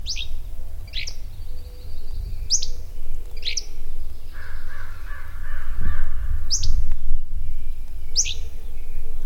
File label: flycatcher; co pueblo mtn park beaulah; paul hurtado